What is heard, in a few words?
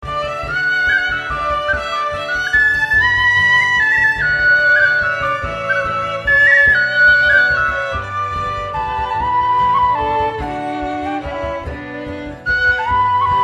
Celtic Quintet